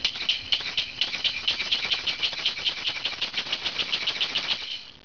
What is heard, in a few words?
shakers; percussion; obele or-shar